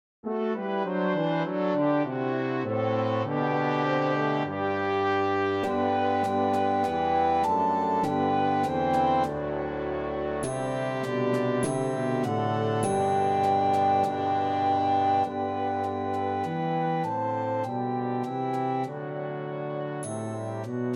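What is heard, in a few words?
Recorder tracks with Melody; Good King Wenceslas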